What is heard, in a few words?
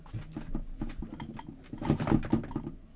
move, tavern; AMBIENT; Pirates Of The Caribbean